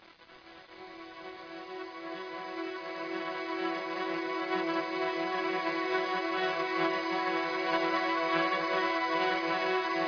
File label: vocod